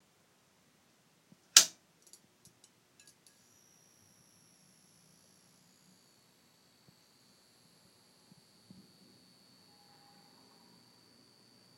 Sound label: Fluorescent light